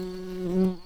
bumblebees, Hypnorum workers

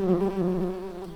bumblebees, Hypnorum workers

